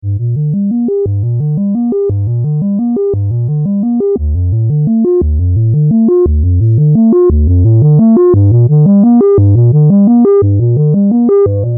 Sequenz FB Drive Ring Mod 1 Oszi

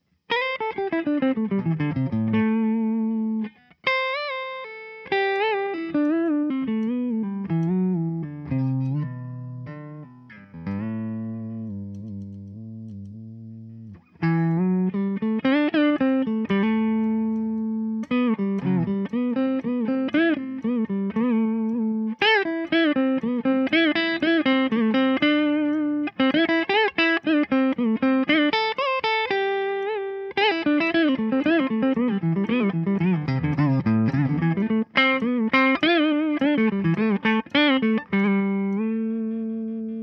2nd up Pentatonik

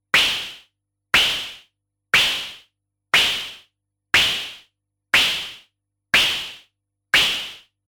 Submarine Snare Noise und VCF; Kobol

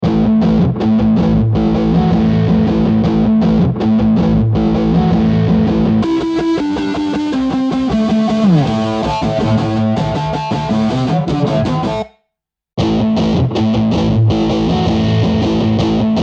Gitarren

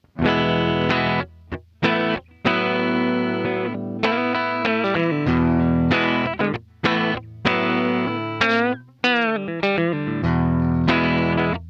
Orange Gitarren Effektpedale Sustain Mid Depth

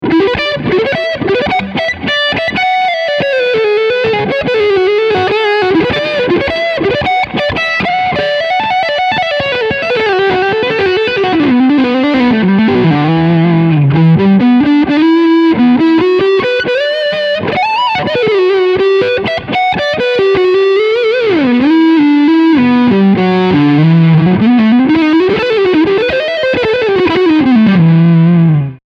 Leadsound Front HB

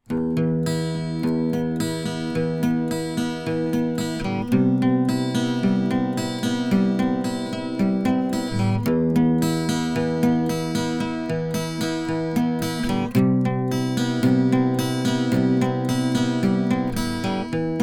Akustikgitarre Picking Transformer ON

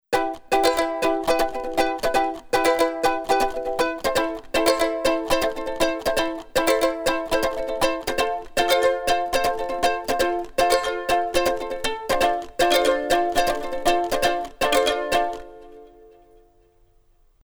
ukulele roh

